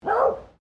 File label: ANIMAL DOG BEAGLE FEMALE BARK; Various domestic dogs; Animals